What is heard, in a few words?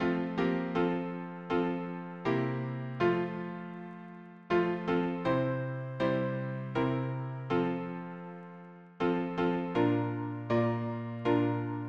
harmony piano